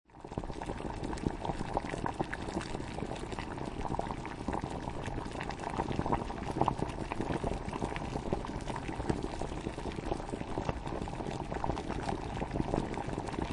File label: Boiling Water, Kitchen, cooking, water boiling; Kitchen Sound Effects; Seamlessly looping sound